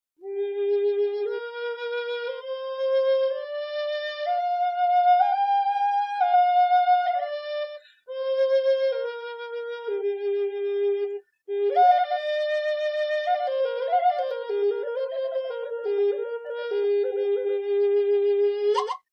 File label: g pentatonic, flutes; Harmony Drone Flute